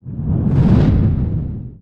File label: flyby; burutaru; android